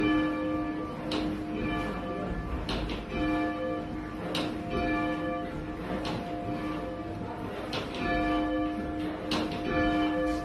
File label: fourth swinging, bells; horsham